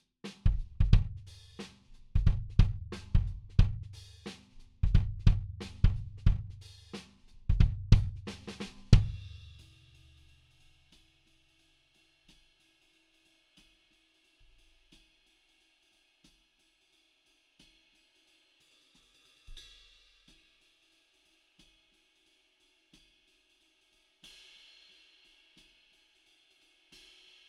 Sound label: Kick